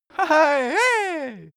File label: cartoon male cheer